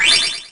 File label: PICKUP; ASTRO256